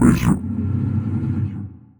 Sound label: Laser